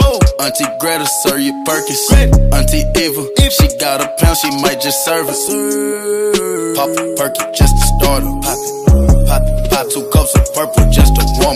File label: new hip hop ringtone